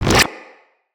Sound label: Magnetize Deflect; Warframes